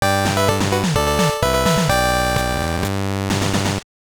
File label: Jingle; Hammerin Harry; gb